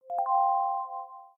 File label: winter unlock; Samsung; Galaxy A5 2014